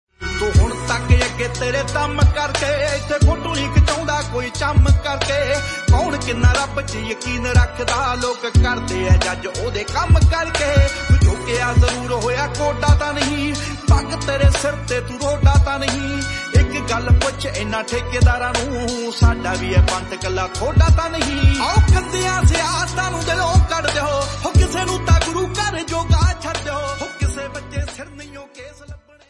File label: Punajbi Song Ringtone